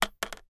industrial paint pot metal handle down on plastic pot